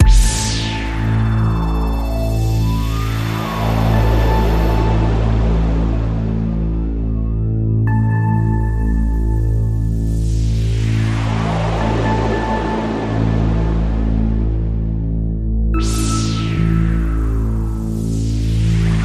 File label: Radio Toolkit Pad